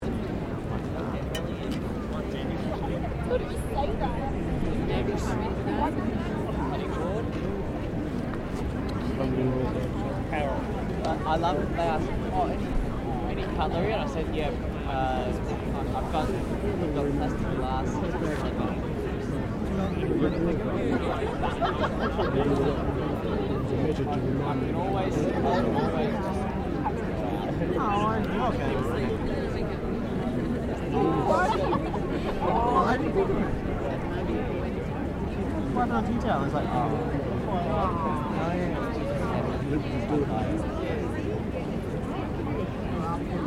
Crowd Large Outdoor Event Chatter, Outdoor event crowd; Crowds; Backgrounds